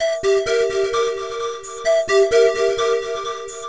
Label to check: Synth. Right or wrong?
Right